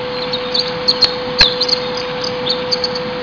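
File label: Japanese white-eye; mejiro; haruna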